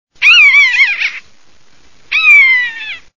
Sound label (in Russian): kanjuk